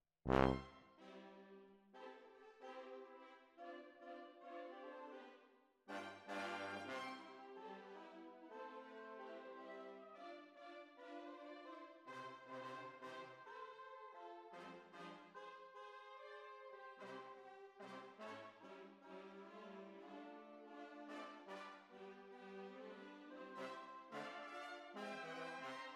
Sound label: Tuba, jazz for piano